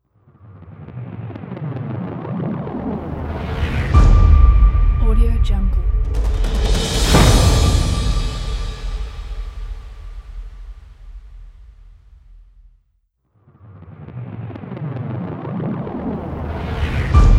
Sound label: logo cinematic uplifting motivational pack